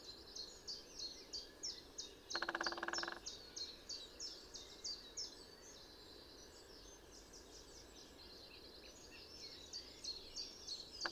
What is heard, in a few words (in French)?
pic épeiche tambour